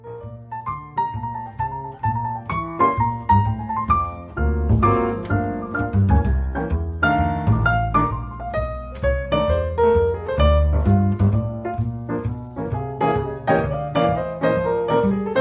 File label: Jazz